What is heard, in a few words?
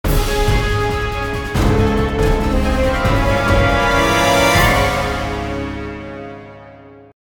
Combat Lose; Flushed Away